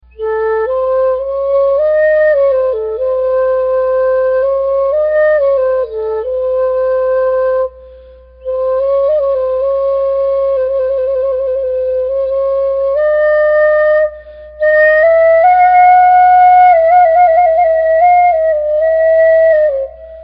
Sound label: arabic soft flute, ringtone